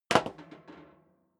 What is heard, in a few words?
step sneaker metal rattle stair down; schritte